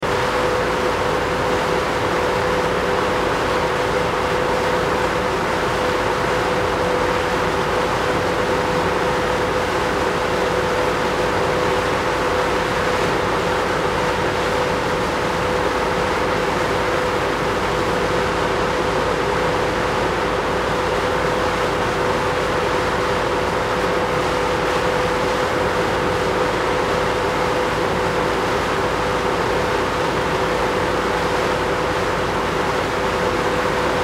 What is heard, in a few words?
Air Pressure Generator Running; Air Pump; Industry; Air Pumps; Machines; Blastwave